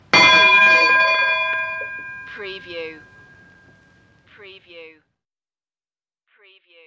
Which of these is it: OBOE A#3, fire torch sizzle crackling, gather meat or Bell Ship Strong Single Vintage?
Bell Ship Strong Single Vintage